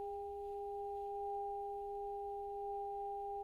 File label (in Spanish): Multiarmonicos